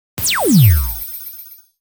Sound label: Celebration Wand